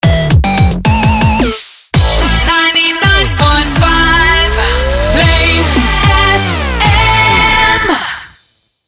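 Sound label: 110bpm A minor Shotgun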